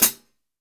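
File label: MIX foot hi-hat, OLD HH; AKAI S-Series CD-ROM Sound Library VOL-3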